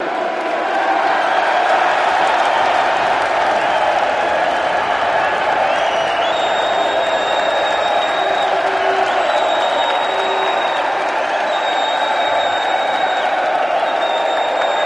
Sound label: Crowd Cheering, big arena